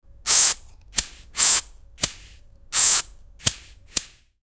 smooth rapid whip sound, swish

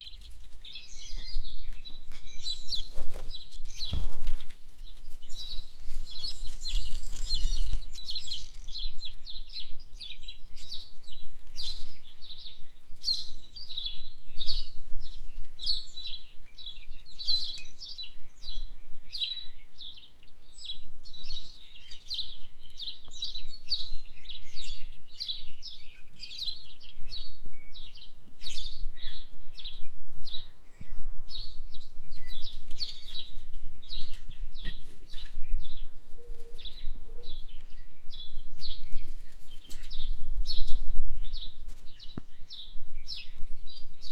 english cottage garden

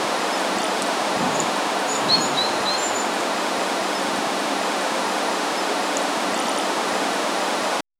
flowing water flapping

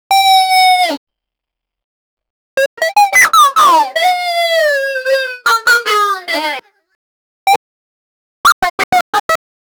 hahahaha, joy

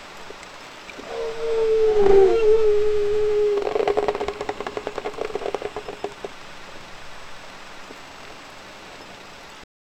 rocks crumbling and

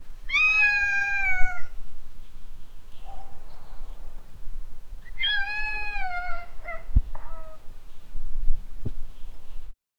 cats meowing

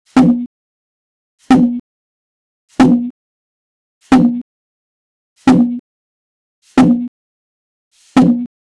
produce a comedic roast, low pitch

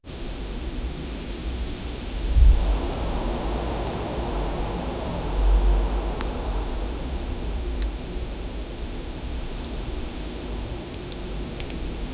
soft candle crackle gentle; cottage

